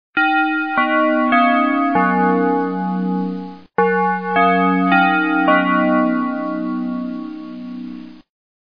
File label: Big Ben